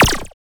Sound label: FX; TRUTH-HZ; Crowdsourced Sample Pack; Digital FX